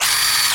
science fiction robot movement

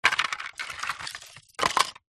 foley wood small thin pieces movement; zapsplat

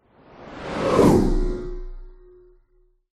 whoosh element pass by machine vehicle

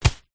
foley raincoat wearing grab arm; zapsplat

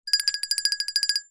bell small hand ring held muted; zapsplat

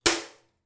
household bath metal plug down on metal bath

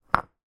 foley brick place down on hard ground; zapsplat

